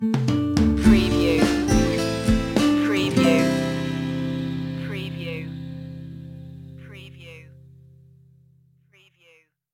idents acoustic with drums

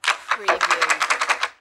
Billiard, Pool, Snooker - Rack up balls in frame

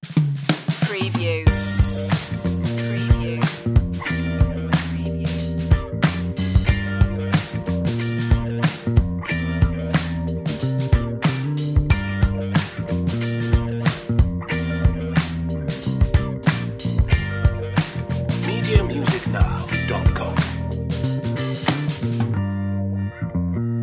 sweet groove short

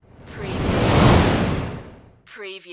Fireball Pass By

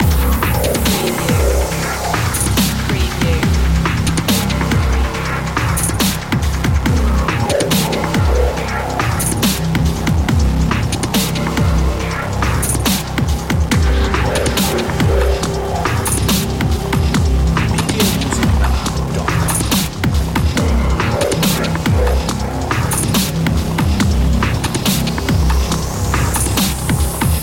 steampunk robot loop

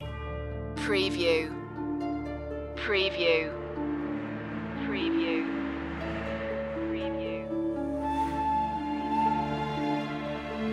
requiem of angels no choir

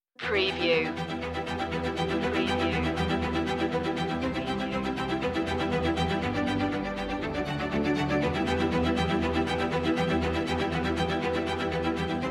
away on the winds strings only

